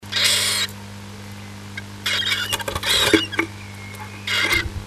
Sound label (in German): m fuettert w, nistkasten